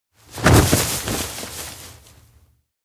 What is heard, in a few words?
fall forest; koyomi; anemoi パッケージ版